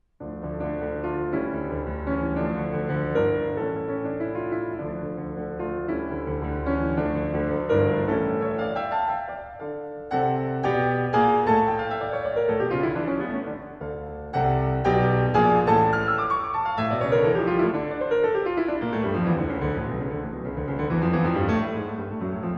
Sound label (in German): Klavier Trio